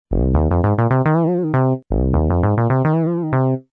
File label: Bass; CZ-1